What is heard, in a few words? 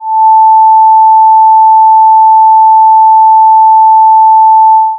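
tone 880 Hz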